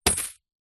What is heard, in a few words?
money pouch fabric coins down on surface; foley